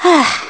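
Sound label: Breathe, gameplay sounds; Link breathing heavily (low health); zelda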